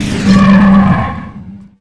emerge roar

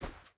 footstep boots snow run; Rust

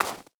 stag footstep snow hard; Rust

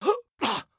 scav death, voice; Escape from Tarkov; scav1

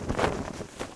Sail Wind; Civ4 warlords

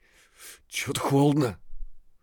bleed in; Escape from Tarkov

